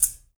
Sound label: BOWL, POTS + PANS; Spectrasonics; Liquid Grooves